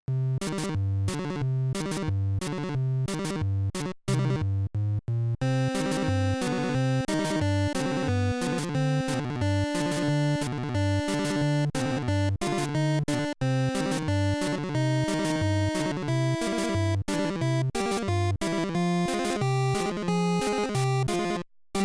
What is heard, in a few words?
halloween world chiptune